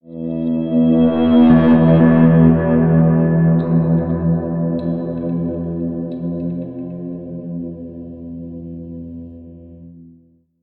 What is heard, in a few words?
Sting; minecraft story mode gamerip 2016; Assembly Required; Boomtown